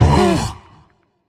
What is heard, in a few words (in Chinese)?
监守者受伤声